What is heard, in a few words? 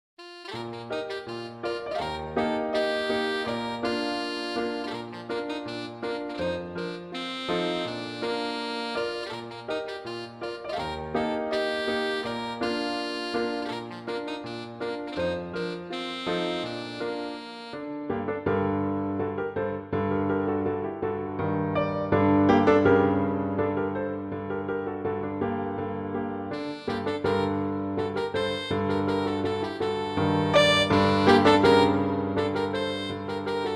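Allegretto, violin; Brahms; Hungarian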